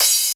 cym

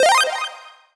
drum hit whistle

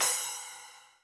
soft hit finish; osu

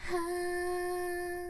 Choir; osu

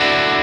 0 3 2 0 Dadd9

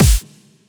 drum hitclap

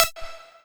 lead

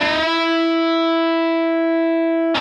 Bend full

